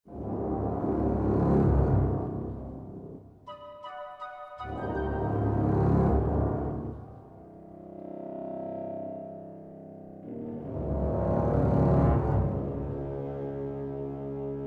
Suspense; littlebigplanet 2

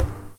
walk barefoot

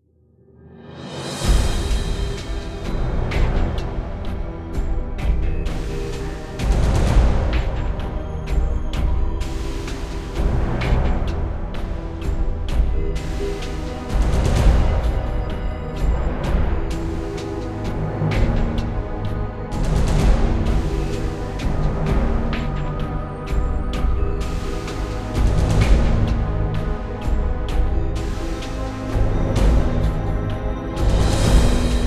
Boss Battle Low; mass effect 2

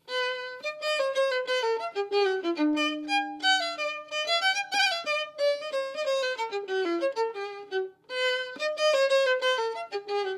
Violin; Assassin's Creed Syndicate

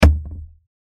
Hit

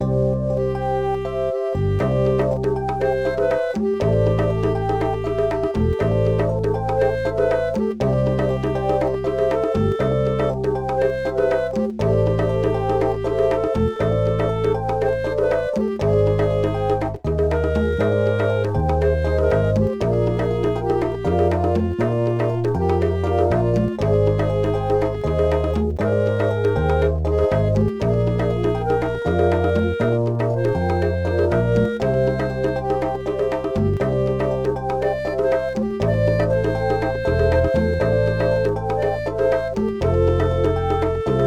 Music Wetlands; Sesame Street Elmo's A to Zoo Adventure

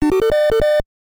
SFX - Life Up; Back to the Future; nes

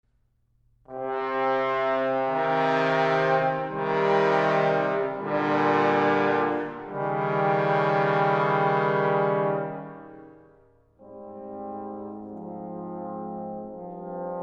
2 Equali in C Minor for 3 Trombones

